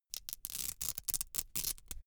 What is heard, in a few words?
velcro open cap slow